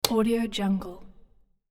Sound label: light switch wall switch; hollywoodedge